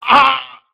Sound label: hurt